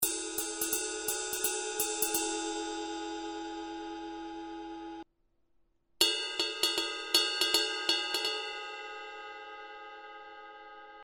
22 inch power ride ride bell, cymbal; 2002; Paiste

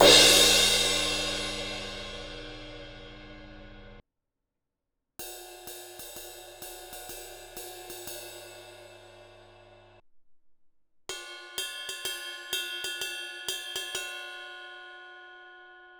18 inch fast crash crash ride bell; a custom; cymbal; Zildjian

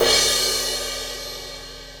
18 inch crash, cymbal; giant beat; Paiste

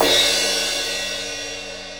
19 inch crash, cymbal; rezo; Zildjian

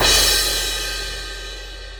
17 inch signature fast crash, cymbal; Paiste

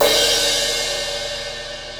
19 inch hybrid crash crash; k custom; cymbal; Zildjian

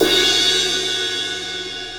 19 inch crash, cymbal; hhx x-plosion; Sabian

